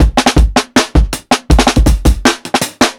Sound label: PICCOLO 80 32ND BOUNCE, DRUMS LOOPS; BASEMENT DRUMS VOL 2